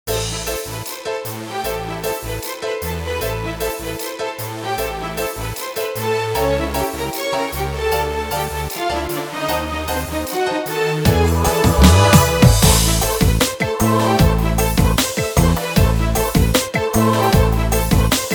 Electro Gospel

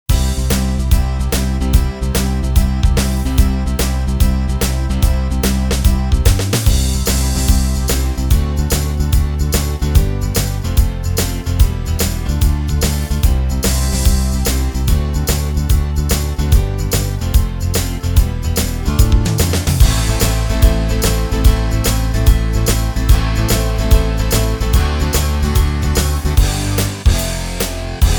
Disco

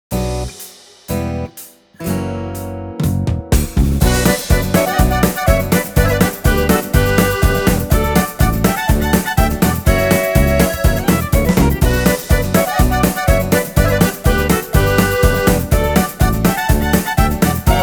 Polish Folk

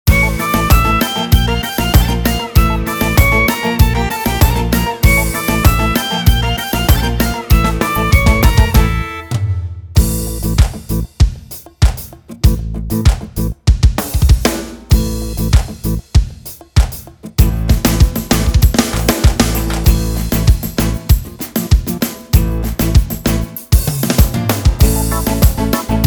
Celtic Gospel

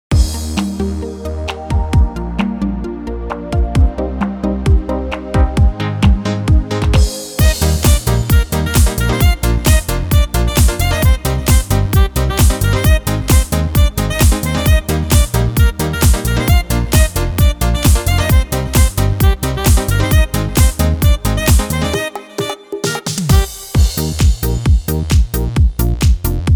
Long Beat

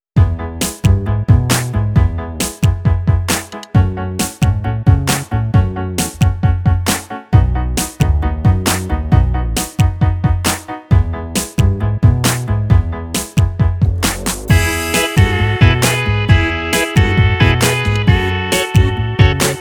Chart style

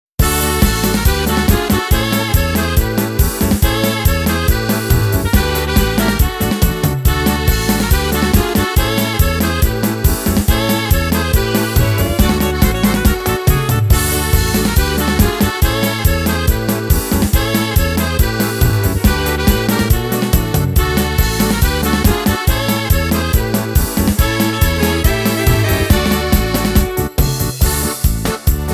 Polka

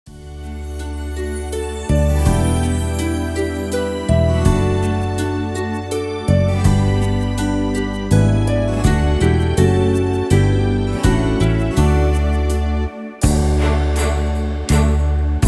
Waltz

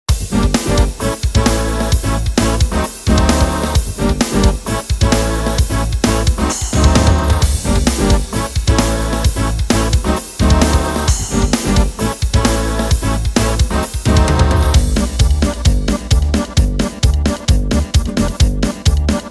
Folk Jazz

